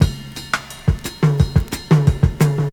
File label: BOSSA 88, loop; 0- 99 BPM